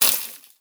pickup winter; hyperrogue

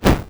fire; hyperrogue

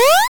orb frog; hyperrogue

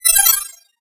pickup speed; hyperrogue

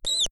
mouse squeak; hyperrogue

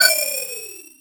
orb teleport; hyperrogue

